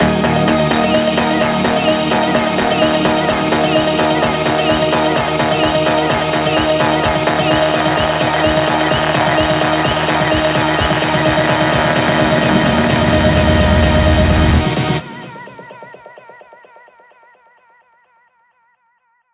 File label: 128BPM Mash Up 8Bars D#, Melody Fills; Vengeance Samples